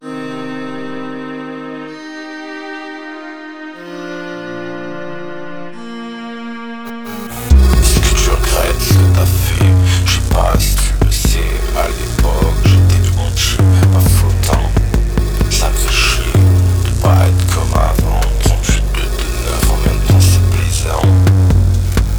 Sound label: live voice changer; graillon3